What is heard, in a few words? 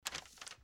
wrapping paper cut